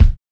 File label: Hiphop kick; Reverb+EQ+Comp+Limit